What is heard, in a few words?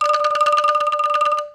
anklung ibu